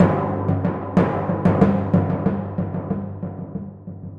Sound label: Timp G